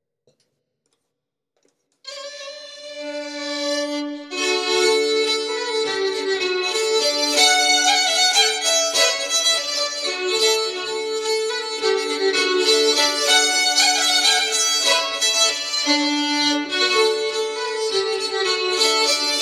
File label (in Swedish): Polska, bagpipe fiddler; efter Gunnar i Österbôtten Storfors